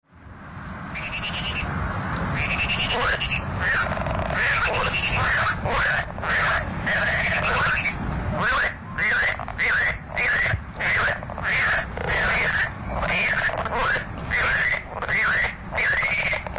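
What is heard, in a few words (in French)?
3 appels, reptiles et amphibiens; il indique aux autres mâles la présence de celui qui appelle et qui défend son territoire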